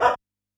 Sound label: DOG; Yamaha DD-65